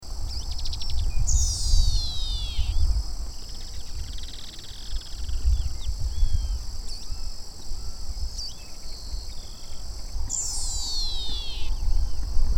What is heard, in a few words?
Anthus lutescens Caminheiro-zumbidor